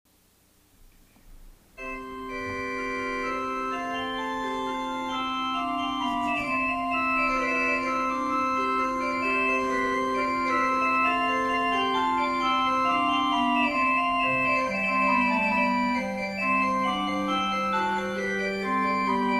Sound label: pastorale, organ